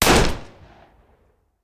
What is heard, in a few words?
Hand Gun; Sound Ideas; Guns